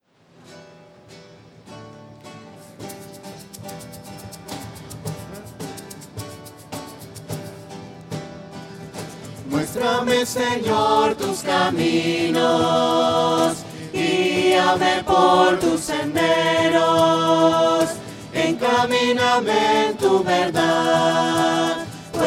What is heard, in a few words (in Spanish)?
voces; muestrame sennor tus caminos; misa